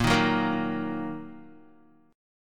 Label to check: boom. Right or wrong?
Wrong